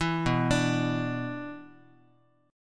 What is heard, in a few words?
B Minor Double Flat 5th B Guitar, chord; Bmbb5 arpeggiated; JGuitar